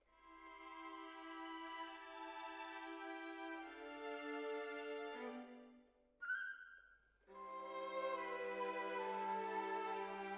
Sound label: Decca, Jazz for piano